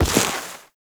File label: Step Snow, Foley, Footsteps; Enemies; Warframe; Duviri; Dax